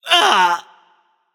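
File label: nonverbal ouch; smite; voicepack; merlin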